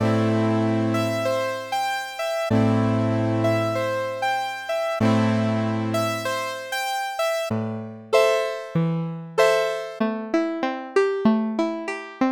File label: synth piano; plugins